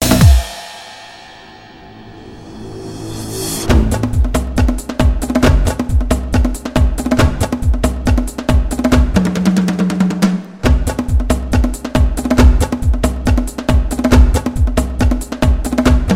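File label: zvuki barabana, drum sounds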